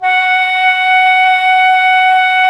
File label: FLU, Flute